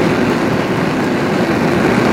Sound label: Gaz Fire Medium LOOP